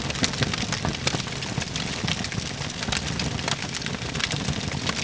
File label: bomb, ambience; cstrike